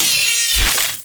Turret Minigun Close; Warframe; Missions; Zariman; Zariman Turrets

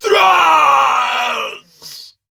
Death; Battle Barks; en; DaxAshigaru; Warframe

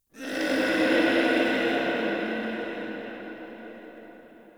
Interior Texture Aggression Calls; Ambience; Indoor; Warframe; Infested Eris

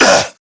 Pain, Male

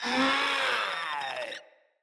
Infested Runner Attack

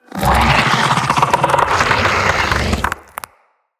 Spider Vocal Chatter; Vocals; Root Spider Mother

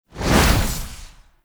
Fuel Projectile Throw; Gameplay; Warframe; Jade Shadows; Ascension

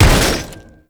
Platform Debris; Props; Warframe; Tau; Circles Of Hell

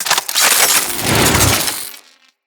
Equip Throw, Foley; Captain Vor; Nervo

